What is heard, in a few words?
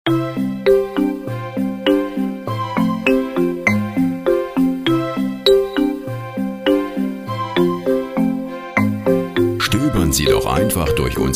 sweet marimba